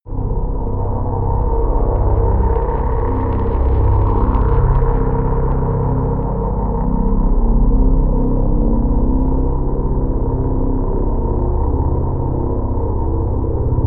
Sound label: drone vinyl